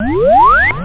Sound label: computer